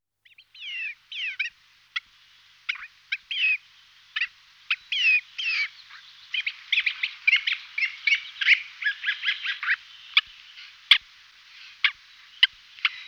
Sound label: c infaustus, passeriformes